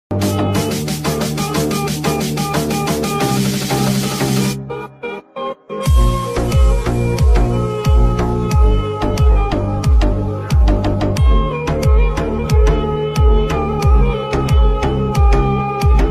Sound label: turkish sad song arabic ringtone